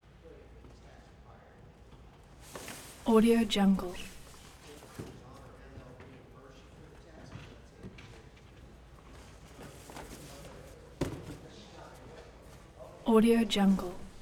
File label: catering crew dish clean up